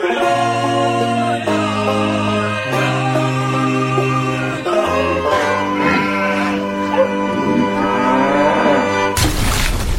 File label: old lady puppet explodes